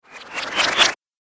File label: Cartoon Magic Spell